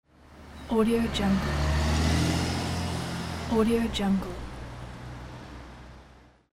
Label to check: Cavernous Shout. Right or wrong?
Wrong